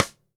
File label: DW Green Maple Cross Stick; Sonic Foundry (Sony Creative Software); Vital Drums